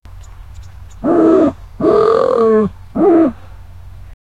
a jaguar growling

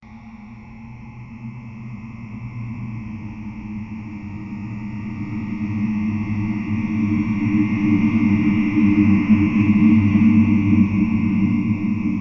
a creepy fanfar

